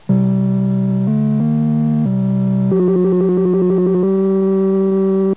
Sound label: Intro Theme; Dum de dum dum.; video game sound effects; Donkey Kong